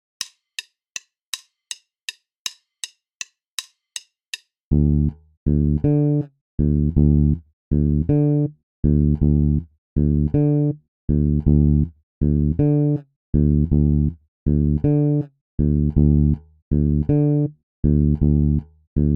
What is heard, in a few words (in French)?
decalage triolets exercice basse tempo 080